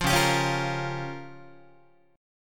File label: C sharp 6th Flat 5th C sharp down Guitar; strummed; C#6b5; JGuitar